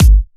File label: Kicks; Vengeance Samples